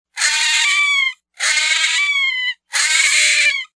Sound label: Snowshoe Hare